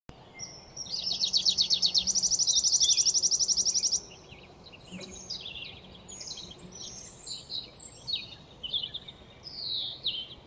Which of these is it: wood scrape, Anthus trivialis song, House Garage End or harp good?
Anthus trivialis song